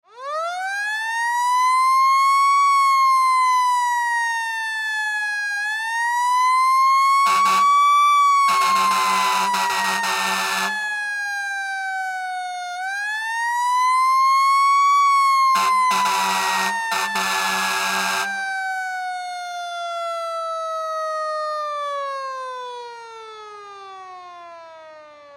Fire Truck Idle Honk Horn Siren Start Honk Stop Horn Wind Out; Fire Truck Engine; Emergency